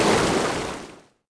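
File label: waterfall medium end; water splashes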